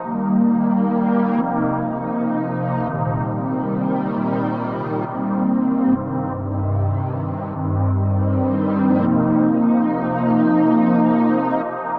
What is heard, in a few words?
SYNTH PAD AMBNT 160 C; Ambient; Sphere